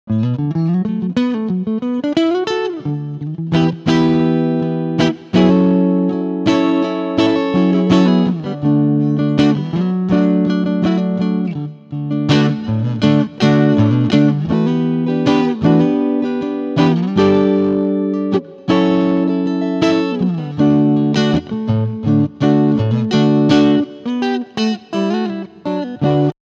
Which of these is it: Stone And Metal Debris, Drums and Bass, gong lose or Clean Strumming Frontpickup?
Clean Strumming Frontpickup